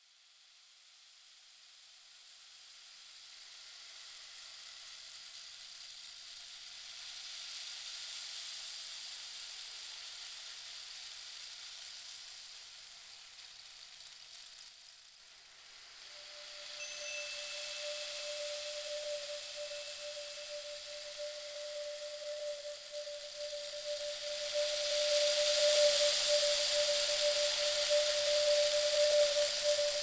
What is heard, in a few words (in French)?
Jardin sonore